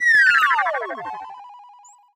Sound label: Robot Happy Balanced